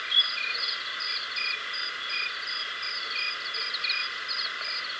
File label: bug night, ambience